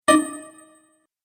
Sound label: Game Sparkle Sounds; Video Game Sound Effects